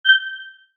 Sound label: Rollover, Short; Mouseovers; Multimedia; Stuart Duffield